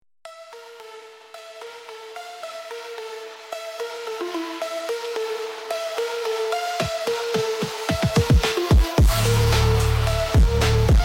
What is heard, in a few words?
midnight drift, phonk